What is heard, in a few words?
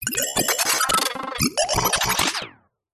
science fiction cyberpunk robotic glitches